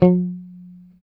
G4 sus, Strat guitar; Spectrasonics; Hans Zimmer Guitars VOL-1; JC-STRAT MTE

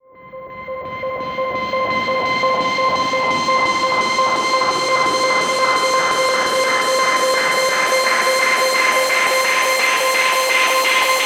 Sfx, Trance; Zero-G Trance Inducer; General Sfx

